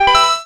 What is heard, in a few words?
KEY Hold Loop Finish; NYC